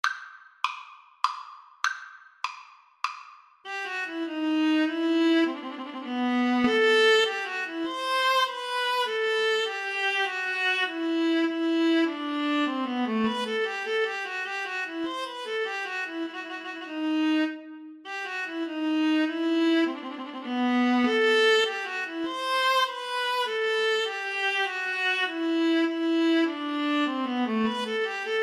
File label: Minuet Viola Cello; arne